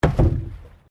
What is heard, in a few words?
PLASTIC BARRICADE IMPACT; Material components for constructing more complex sounds